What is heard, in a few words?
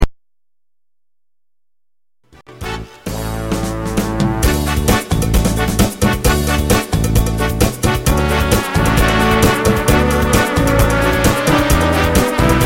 cha cha cha; karibik